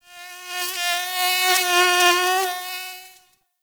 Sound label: Forest Close Insect Buzz Close; Warframe; Grineer